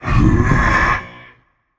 sigh, voicepack; smite; jorm

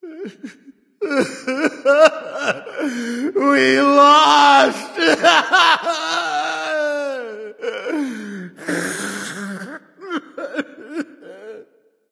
boohoo; smite; vamana

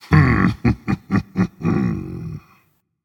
heh heh dragon; fafnir

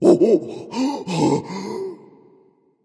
grunt hurt; smite; voicepack; hun batz

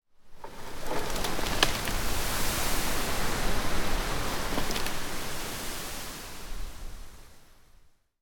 ambience foliage; smite

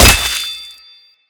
basic impact; smite; thanatos

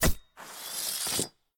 lance scrape; smite; lancelot

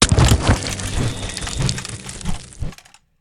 mvt death; serqet; smite

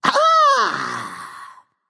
nv discover ah; smite; voicepack; ganesha

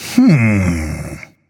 nv thinking; cupid

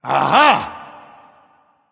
"ah", discover; smite; voicepack; ullr

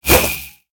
whoosh; smite; raijin

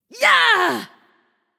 "yay"; smite; nuwa

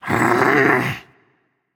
growls

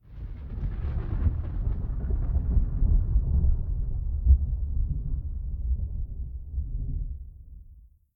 victory thunder rumble; Smite; Susano

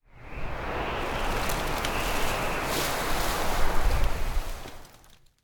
ambience foliage; smite; conquest

